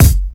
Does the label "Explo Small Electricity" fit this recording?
No